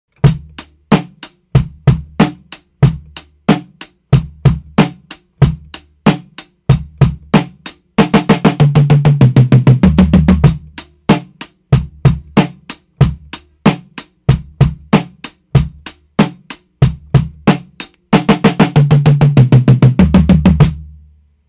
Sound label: Beat 2 With Fill Fast